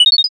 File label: sparkle